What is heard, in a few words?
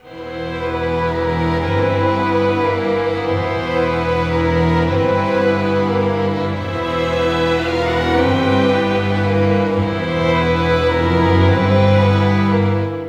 Cinematic Strings, Orchestral; Sonic Foundry (Sony Creative Software); Orchestral Series VOL-3; Cinematic 17